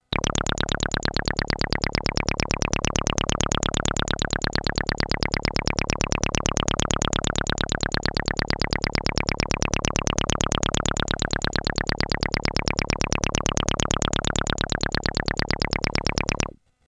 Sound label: tb303 saw accent